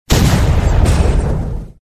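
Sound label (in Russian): звуковой эффект взрыва миномета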